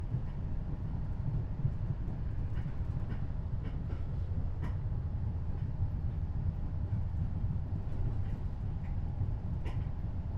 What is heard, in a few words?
Train Siemens Desiro Ambience Interior Corridor Passage Middle Constant Speed Fast; Rattle; FLYSOUND